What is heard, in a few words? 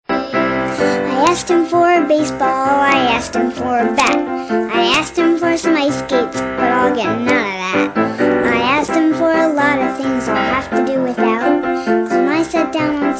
Kids Xmas Song